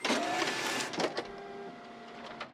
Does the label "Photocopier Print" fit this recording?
Yes